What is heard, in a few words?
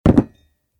Put down the beer mug